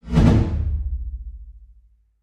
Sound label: Bass Impact Double